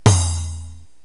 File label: DRUM, Proteus; Drums